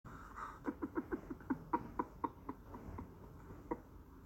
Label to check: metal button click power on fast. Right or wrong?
Wrong